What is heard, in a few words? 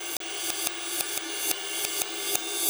Cymbol Particle; Particles